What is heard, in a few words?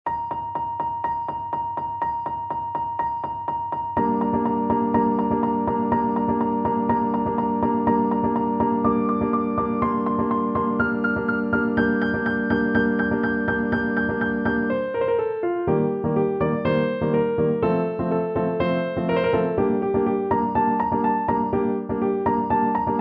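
piano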